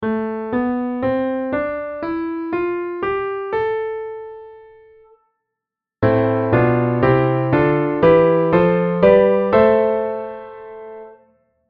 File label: A minor diatonic